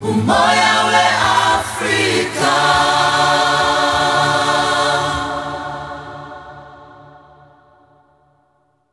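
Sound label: AFRICA AAH, MIXED CHOIR; Spectrasonics; Heart of Africa 2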